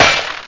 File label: shatter